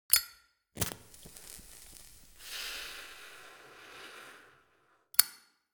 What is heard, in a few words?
cigarette light; Film Noir SoundPad